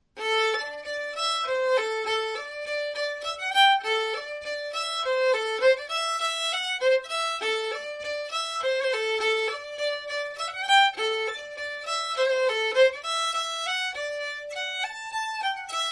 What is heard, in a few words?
Hornpipe